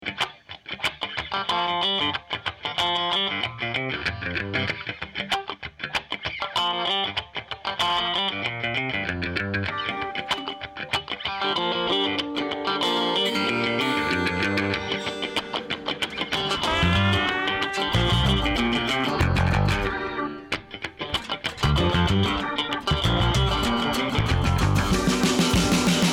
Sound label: Blues